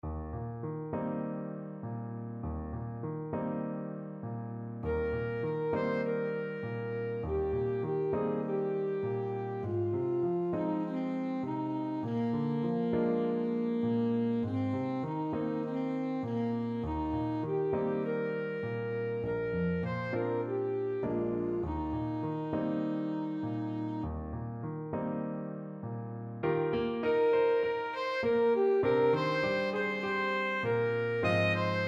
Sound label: songaji, saxophone